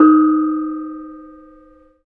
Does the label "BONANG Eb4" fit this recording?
Yes